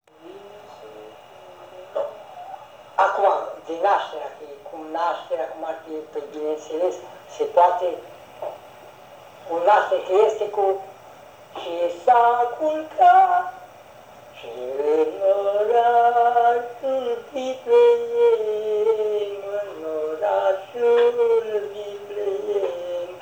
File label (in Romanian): Colinda; Cuviosul